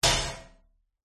impacts metal hit strike clatter rattle resonating roomy; zapsplat